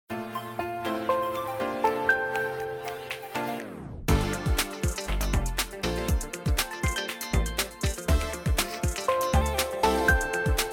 The Voyage pop remix, ringtones; Samsung Galaxy S22; Retro

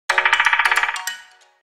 Wood email alert; Nokia; Audio themes

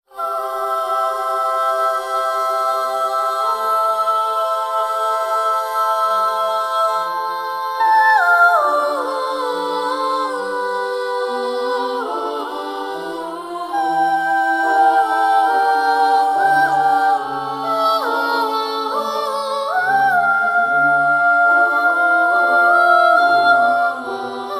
Air on the G String; LG; G2

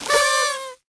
party favor, notifications; Google; Google Sounds

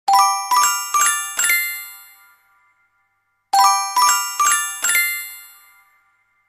Classic ring, ringtones; Sony Ericsson

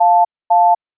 waiting tone; Windows Mobile 6.0

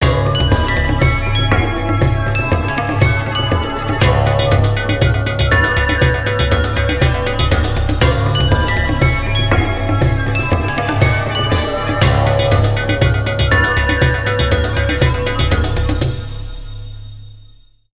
RainForest II; Nokia; PlusFourSix; Lars Edvall

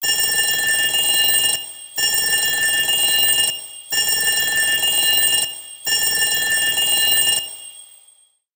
mechanical bell, alarms; Cubot X6

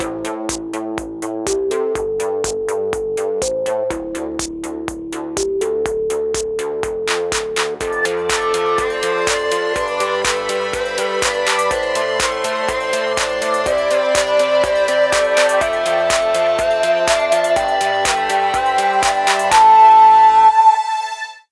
oscillation; Motorola QRTY; ringtones

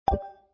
Drop; Velvet

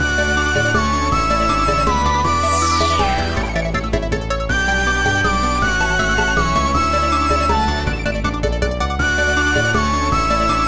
Valse des fleurs, ringtones

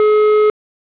SubscriberBusy, Samsung SCH-M490; Omnia; CEPT

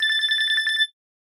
Simple Beep

